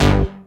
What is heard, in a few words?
Synth Bass; Vengeance Samples; VEE Synth Shots